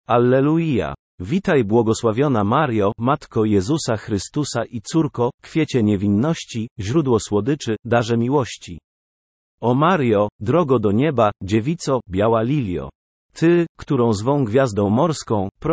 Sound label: Alleluia Ave benedicta, Sequences in medieval Krakow